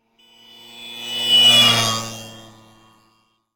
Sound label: motorbike horn; Blind Drive